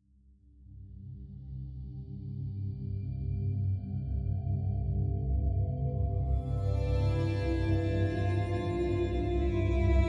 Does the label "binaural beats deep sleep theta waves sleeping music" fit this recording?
Yes